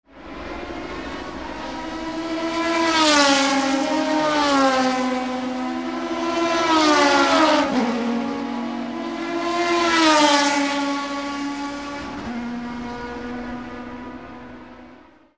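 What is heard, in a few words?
toyota formula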